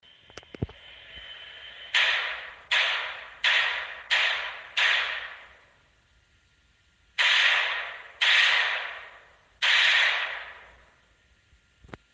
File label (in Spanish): tiro de glook